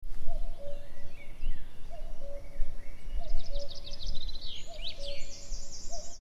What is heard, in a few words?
Cuckoo stereo; Fritham